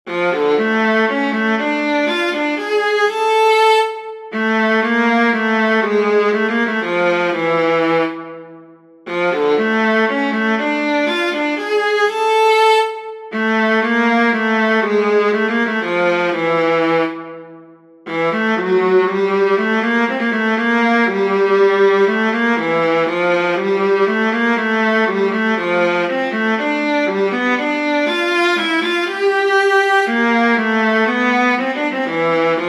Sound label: for viola; bach johann sebastian; aria reia euch los bekra nkte sinnen